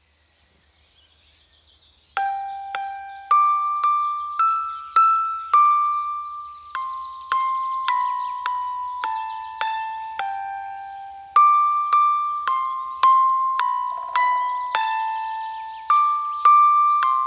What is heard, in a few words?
Twinkle Twinkle Little